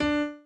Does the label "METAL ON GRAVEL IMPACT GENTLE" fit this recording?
No